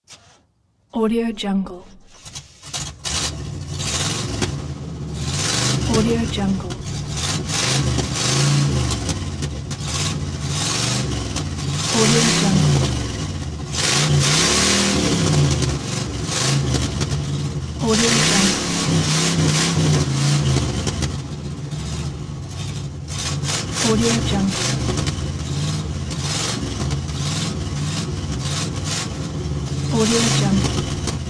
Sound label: car bad exhaust start rev stop